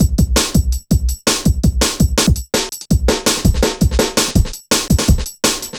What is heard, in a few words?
Programmed Stepz, Jungle Drum Loops; Zero-G Jungle Warfare 1, 2 & 3; 90 s Sample CDs